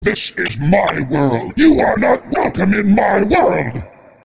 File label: intro, giant zombie soldier; slender